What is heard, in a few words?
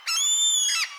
Spyglass; puzzle-quest